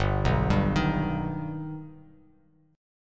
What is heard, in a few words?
G Augmented, Bass Standard; G+ arpeggiated; JGuitar